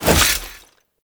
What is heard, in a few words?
Heavy Stomp Start; Abilities; Warframe; Tau; Dax Heavy